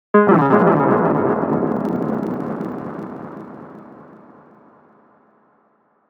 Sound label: nay